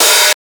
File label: OP HAT DIST, PHUZZ DRUMS; Spectrasonic Distorted Reality 1